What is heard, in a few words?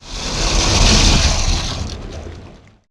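water suck; Comanche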